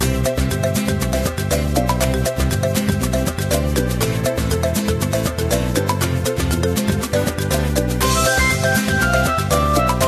Menu Interface Music; Sprill Aqua Adventures

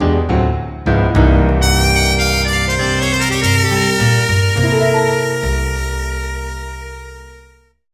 Found You ~ Underground Encounter [Jingle]; Drihoo

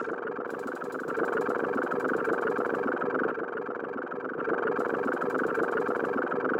Queen Stun Idle loop; we are the dwarves gamerip 2016

